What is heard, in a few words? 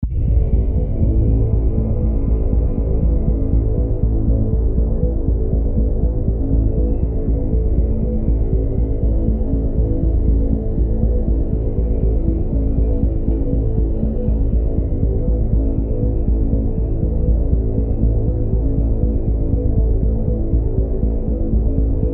Drone Scifi Tonal Thumping Pulsing Atmosphere; Surreal Helicopter; Horror Science Fictio